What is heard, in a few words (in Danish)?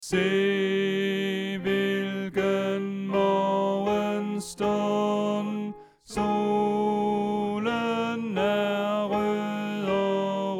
Sopran; oevefiler